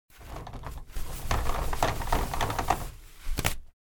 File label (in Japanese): ohuro